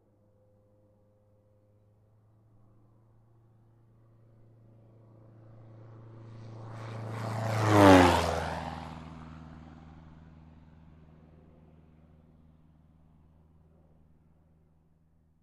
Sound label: Light Aircraft Whirring Sound